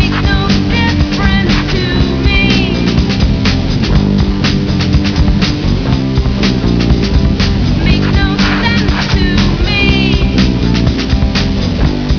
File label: creature; You Creature